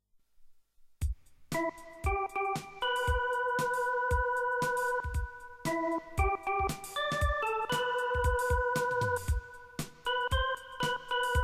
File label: Happy day Soprani